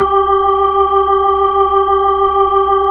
B-3, Keys of the 60s and 70s

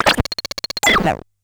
Bent Talking Teacher, Circuit Bent

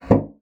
bassoon slap; Xsample VOL-6; C BASSOON

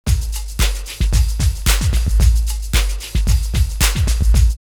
Clap Trapped 112, drumloops OldSchool; X-Static Goldmine

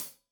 Hat Tight, Hi-Hat, Cymbals

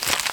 Foley Hi

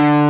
D-2, GUITAR; AKAI S-Series CD-ROM Sound Library VOL-8

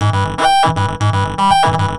Throatline, Industrial; Nasty

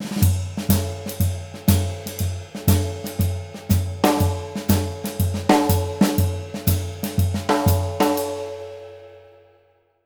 120 SHUFFL; Univers Sons; Jazzistic

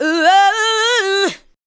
ADLIBS

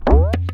tape fx, scratch; Ambient Grooves

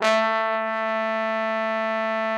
TROMBONE; Best Service

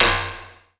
noise 2, sound noise; NOISE+ALARM

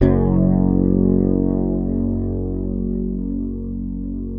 Fingered bass F#1 R; Sampleheads; Will Lee Bass Library

